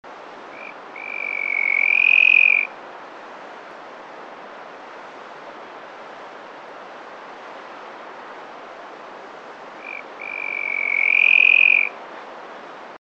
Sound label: Grallaria andicola Antbirds